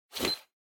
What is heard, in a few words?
Stopwatch Digital Start Stop Arm Swish